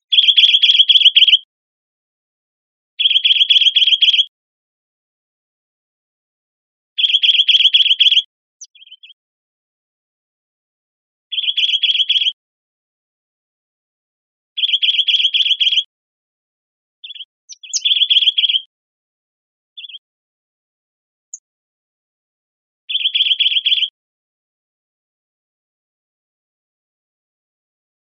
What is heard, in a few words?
BRTI, Chickadees Allies; AZFO; Deviche